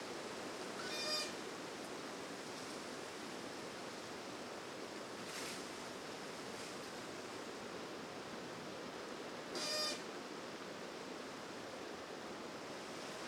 Mixed Forest Wind Blowing Through Birches Beeches And Spruces Tree Trunk Squeakin; Forests of Norway; Quad; Justsoundeffects